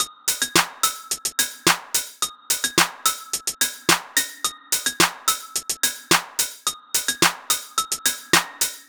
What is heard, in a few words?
menu bg music; PuzzlePocalypse